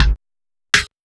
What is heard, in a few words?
Beats, Synchro Funk